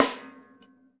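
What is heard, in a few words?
snare drum, Drums Acoustic; USB Soundscan vol.10; AKAI; RAW KIT 9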